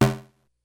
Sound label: Snare, Korg DS-10